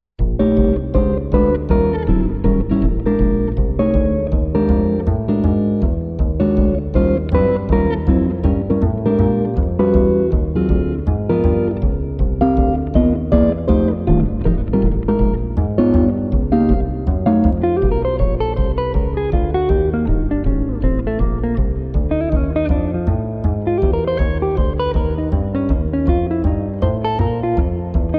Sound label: blues